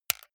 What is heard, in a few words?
console controller xbox rb creaky press hard